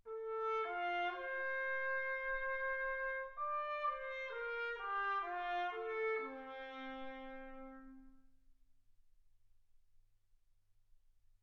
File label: trumpet